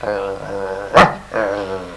dog; Little Red Riding Hood

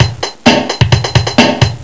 Docked; ZOA

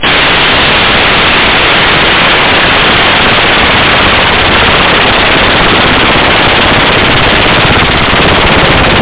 CUT

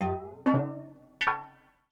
ethno 125

